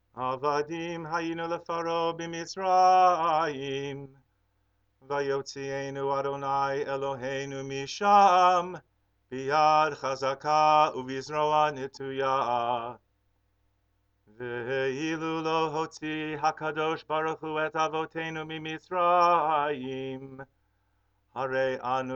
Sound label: Avadim Hayinu chant